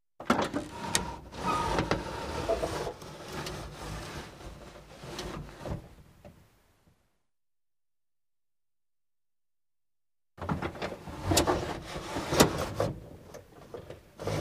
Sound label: Car glass lowered, raised manually; windows